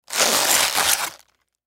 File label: Cabbage Open Tear Stress; Foley Food Cabbage Open Tear Stress Vegetable; Food (Sound Effects:Household); Blastwave